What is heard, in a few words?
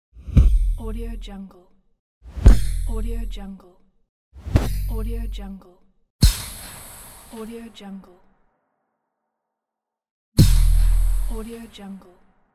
thud